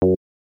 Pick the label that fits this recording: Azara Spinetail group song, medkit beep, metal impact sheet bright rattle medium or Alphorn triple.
medkit beep